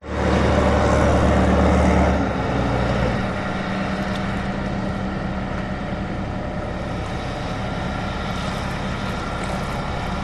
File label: vehicles boat dredger operating harbor recorded from rocks waves lapping